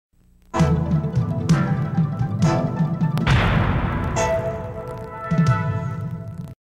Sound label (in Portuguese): telecurso abertura